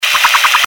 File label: good action; CAC ASSETS